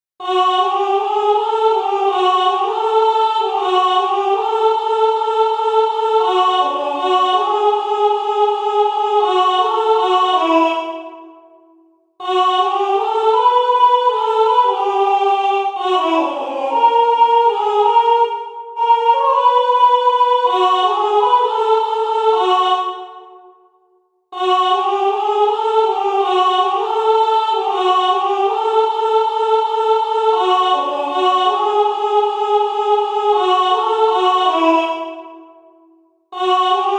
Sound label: soprano